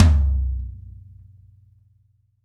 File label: TOM F, LA TOM